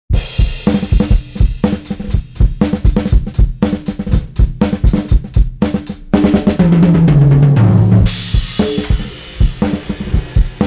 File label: Lindell 6X-500 LOW PASS